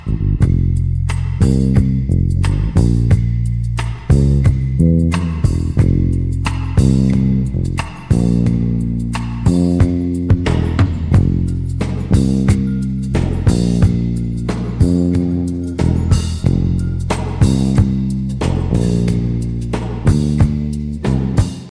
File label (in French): chorus rythm bass, La rythmique basse / batterie du chorus